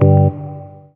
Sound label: House Organ; Big Fish Audio; OSH Chords